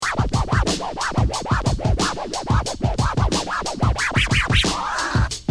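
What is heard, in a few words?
tear beat, scratch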